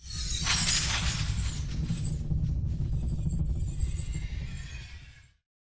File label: In Flight Whip Crack